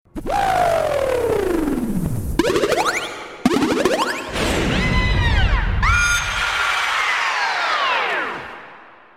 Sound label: Bubbly Message; meloboom